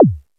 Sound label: SYNTHBASS; East West - Global Sounds